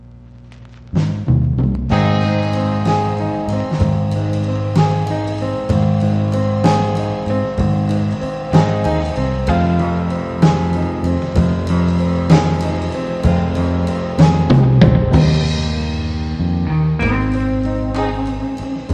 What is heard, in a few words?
Instrumental